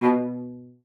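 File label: CELLO spiccato B2; Xsample VOL-5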